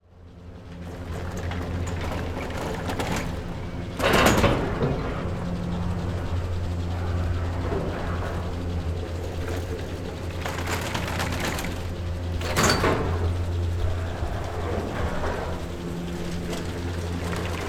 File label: Gondola, Snowboard, Ski, Lyft, Style, Small, Size, Mechanism, Pulley, Passing, By; Rzpost; The Gondola Lift